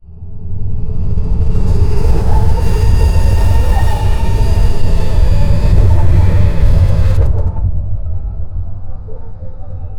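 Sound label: immersive roller coaster; amusement park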